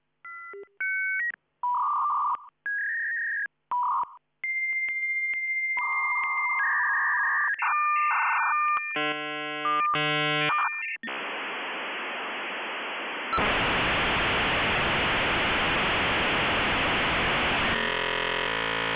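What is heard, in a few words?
Netcomm Roadster II Ultra SVD two tone crescendo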